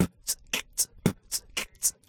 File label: Beatbox